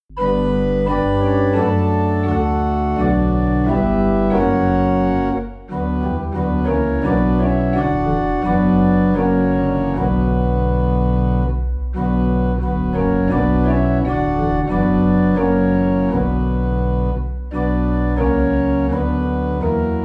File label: Organ Low in B Minor; Jesus Lover of my Soul